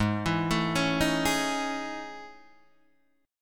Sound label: A Major Sharp 11th, G sharp